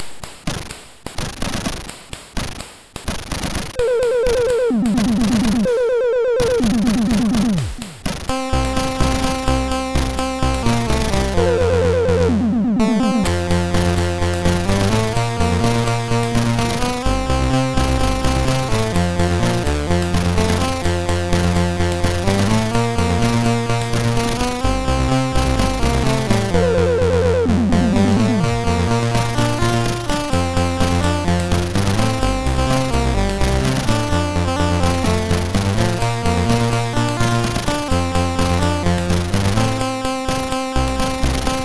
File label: Remix, sid MOS6581R3